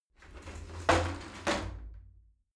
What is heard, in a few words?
shower slide door open regular